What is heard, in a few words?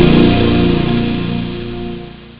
voices; Amiga Soundtracker Sample Packs